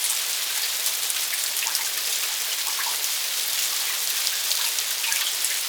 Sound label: Shipyards Rain Metal Surface; Exterior; Warframe; Grineer Shipyards